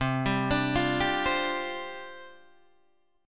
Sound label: C Suspended 2nd Suspended 4th, down, Guitar, Open D